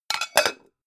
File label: pan close hard